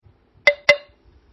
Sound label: Tick Tock